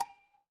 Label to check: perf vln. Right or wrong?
Wrong